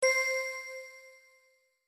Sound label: bell